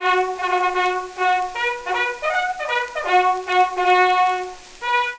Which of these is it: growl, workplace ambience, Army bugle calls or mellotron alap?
Army bugle calls